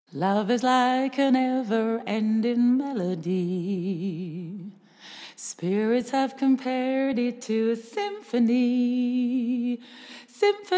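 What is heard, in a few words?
ENUK Song, British English; GoLocalise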